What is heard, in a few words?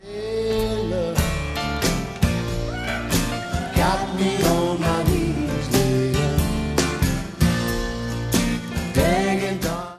acoustic